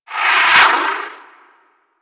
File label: Rocket Whizz By; Warframe; DE; Vehicles; RailJack; RailJackEnemyShips; Grineer; Space Fighter Dredger Agent